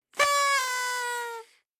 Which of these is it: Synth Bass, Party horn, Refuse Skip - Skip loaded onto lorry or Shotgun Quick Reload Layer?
Party horn